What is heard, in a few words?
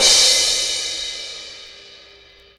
Crash, Cymbal, Drums; One Shots; Ableton Live 12 Lite; Core Library